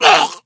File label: death